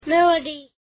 melody

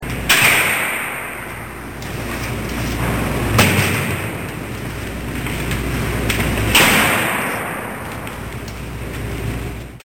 Jail Door

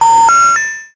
error beeps

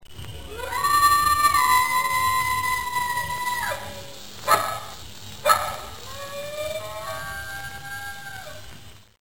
Coyote

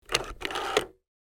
rotary phone

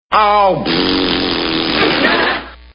Oh Razz Berries

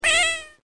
cat

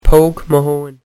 kiss

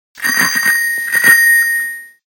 bicycle bell

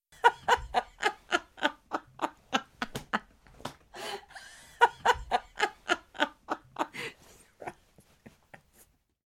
Laugh Female

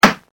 Hammer

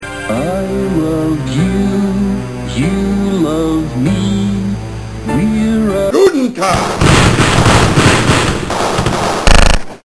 BARN WOLF

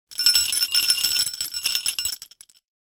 jingle bell

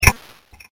metronome

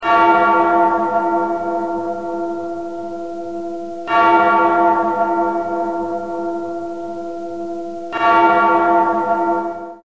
bell toll

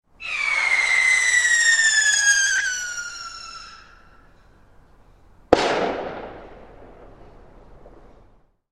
rocket & bang

